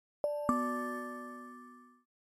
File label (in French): synthe